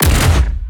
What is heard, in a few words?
tiger punch